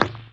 thump spinner shot

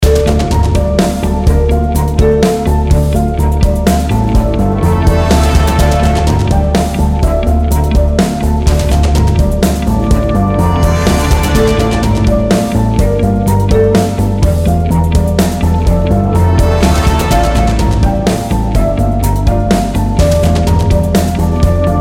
Guitartheme; Terra Invicta

